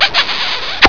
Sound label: kiss